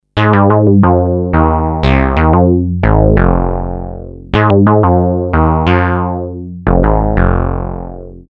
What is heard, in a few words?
reso bass